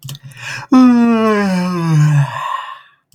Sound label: Human sounds Human voice Yawn, vocal imitation